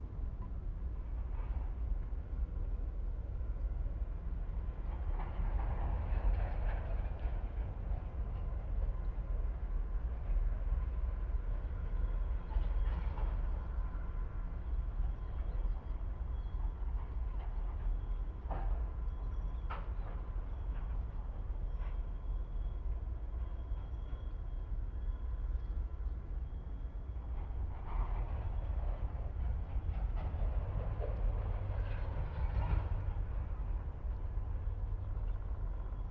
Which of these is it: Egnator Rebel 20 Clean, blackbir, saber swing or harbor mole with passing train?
harbor mole with passing train